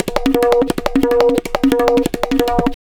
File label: tabla, 175 bpm; Zero-G; Pure Tabla